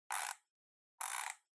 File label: Kitchen Timer Wind Up